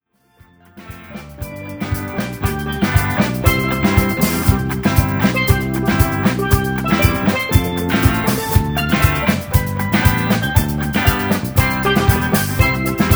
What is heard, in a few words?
Jingle Bells